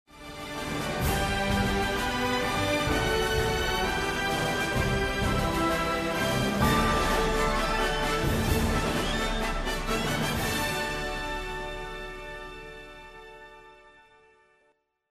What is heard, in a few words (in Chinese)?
片头音乐; 30秒以内